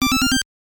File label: Buzz In; Super Jeopardy; NES